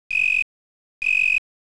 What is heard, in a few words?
hirobanekantan, insects